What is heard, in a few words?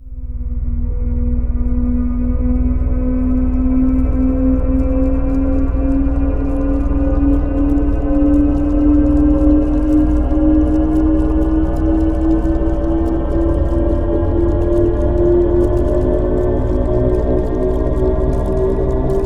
Pad; Dream Zone